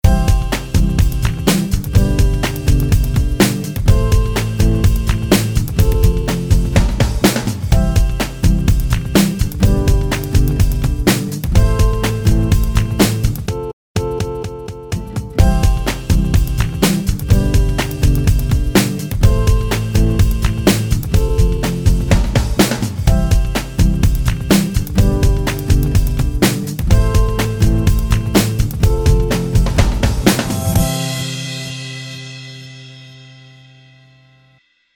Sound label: Harmonica Swingin; SHORT BUS